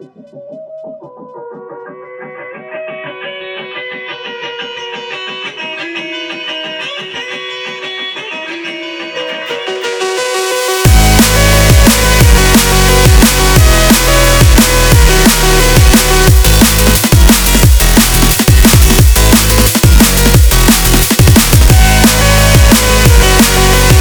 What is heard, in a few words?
chords